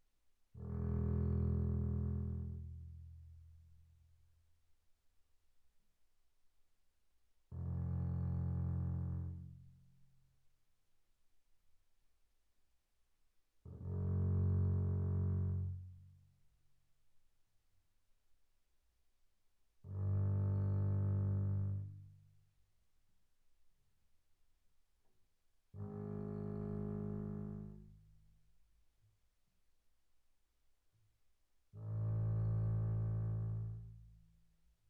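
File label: Bass arco sul E pianissimo E1 Bb1, double bass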